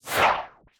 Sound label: Whoosh